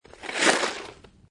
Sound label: Snow And Ice Ice Scrape Moderate; Sound Effects:Weather